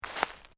Footsteps Sneaker Leaves Deep Step; Footstep Foley; Footsteps (Sound Effects:Human)

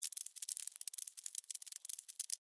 Spider Walk; Animal; Arachnid, Insect, Bug; Spider Sound Effects; BlastWave

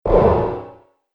Synthetic Crash Sound, impact; Miscellaneous Impact Sound Effects

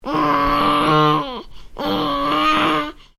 Baby: making vocal noises, slight growl; Voice; Baby Sound Effects; Babies (Sound Effects:Voice); Stuart Duffield

